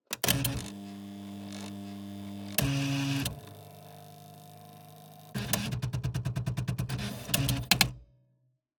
Head-Movement Initiate Malfunction, Electric Typewriters; SoundBits